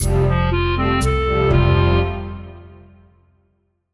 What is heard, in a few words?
jingle cute pop